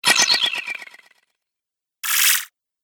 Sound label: Magic Spells; Magic Sound Effects